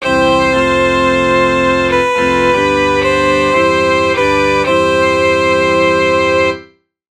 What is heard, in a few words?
cadence bach